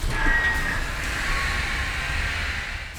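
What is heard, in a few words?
machinery out loop; Garry's Mod; tf2; cp harbor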